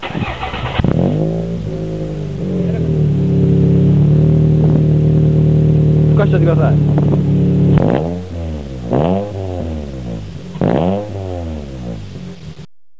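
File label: 3SGE, muffler, TOYOTA CURREN; RSR EXMAGII (ST202用)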